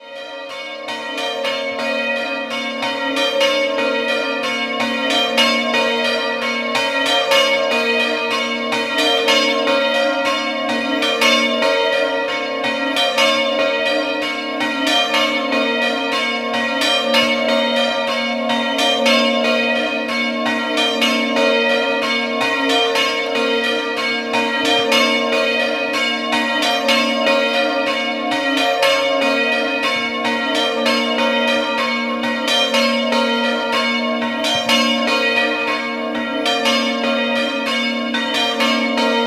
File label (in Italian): Rorai Piccolo, campane